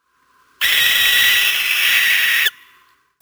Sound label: barn owl scream